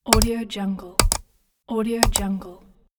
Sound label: nervous mouse click; terrasseproduction